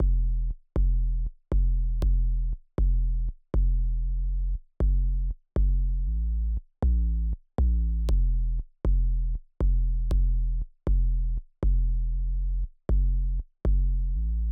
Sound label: 808 Driven